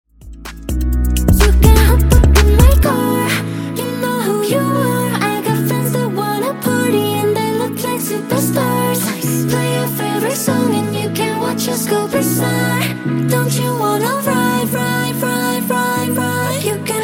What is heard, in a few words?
Intro Outro Dirty 126bpm